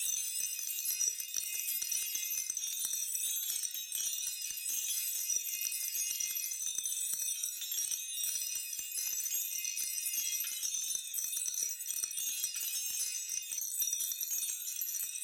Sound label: big win beads; fruitblaze; netent